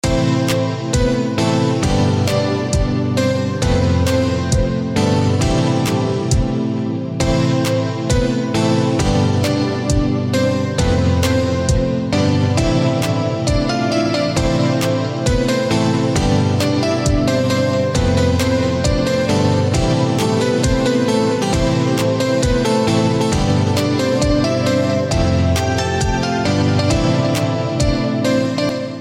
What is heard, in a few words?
Piano melody